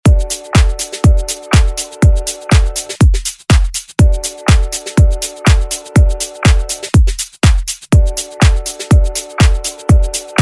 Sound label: Top40 Redrum